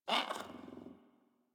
Catbrow Kitten Speak Playful; Warframe

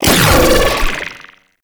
Riot Moa Net Ability Fire; Warframe; Attacks

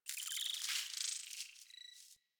Landscape Close Texture, Ambience; One Offs; Warframe; Venus Open World

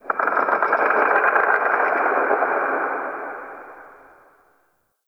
Under City Texture Mid Croak

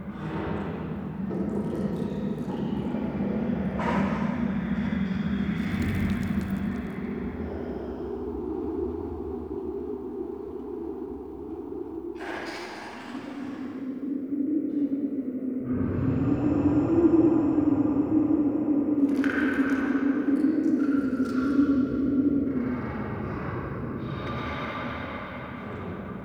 Under City Large Room Ambience Loop, Dungeons; Beds

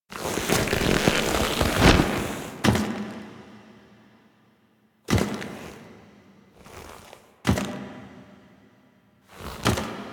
Kiss Foley; Cinematics; Ballas; Warframe; The New War; Throne Room; Quests

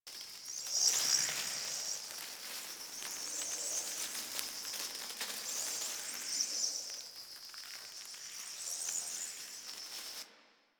Landscape Insect Loop; Warframe; Ambience; Venus Open World; Positional Stereo Loops

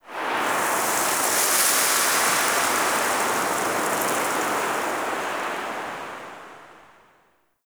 Sandy Wind; Ambience; Man In The Wall

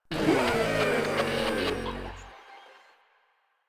Runner Death; Enemies; Warframe; Proto Infested

